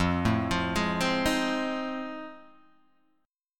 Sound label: F 7th Sharp 9th, Guitar, Open G